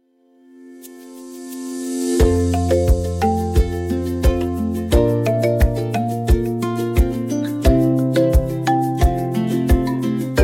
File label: morning glow study